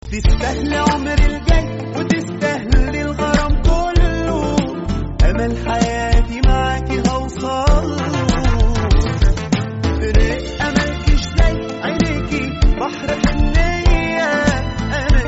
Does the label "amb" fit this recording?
No